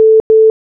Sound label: incoming call active simple; Palm; webos-applications